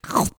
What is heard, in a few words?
eat; minecraft; SMCNV-pack